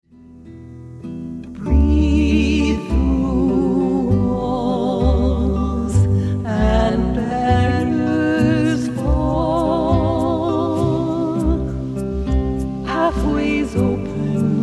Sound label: BREATH